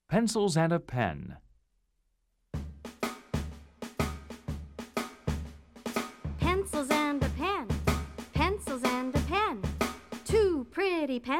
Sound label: Solo, chants; logos english; Let's Chant, Let's Sing 1